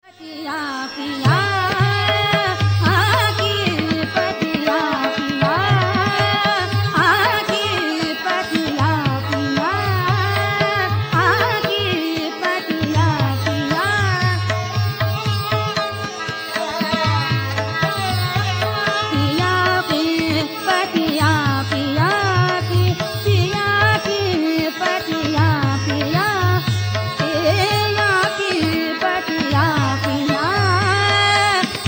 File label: drut; hameer; mudgal